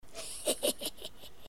risada maligna

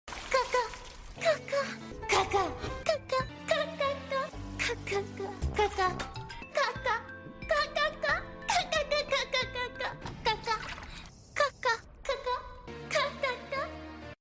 risada; oshino shinobu do bakemonogatari series

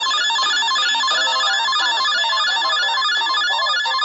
sirene

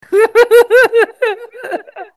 risada

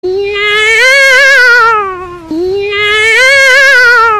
gato inhauuu

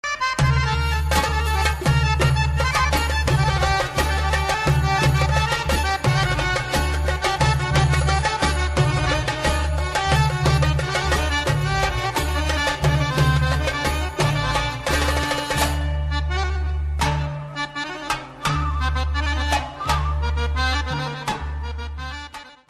danca do ventre

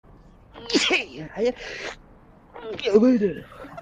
espirrando

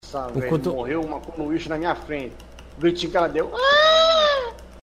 aaaaa gritinho

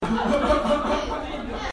risadinha

